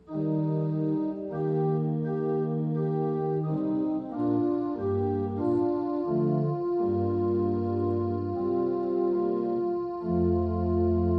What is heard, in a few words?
lp modulation